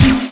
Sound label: spike